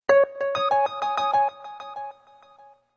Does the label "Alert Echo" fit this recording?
Yes